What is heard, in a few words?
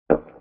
Underwater Collision Glass